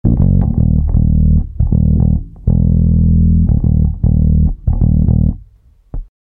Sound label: bassline